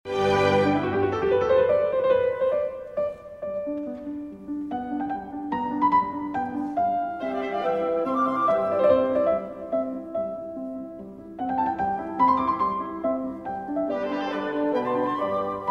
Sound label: Piano Concerto in G major; Mozart